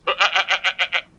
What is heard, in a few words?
goat